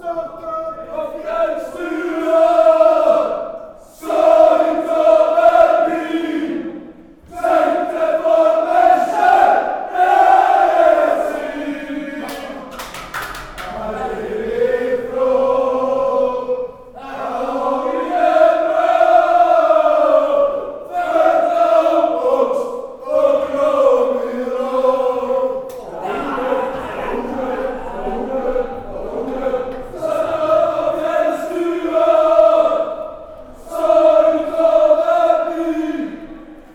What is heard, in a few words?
chant, fanchants; aalesunds